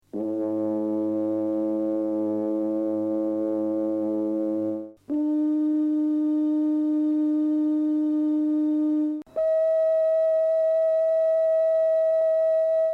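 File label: french horn, chamberlin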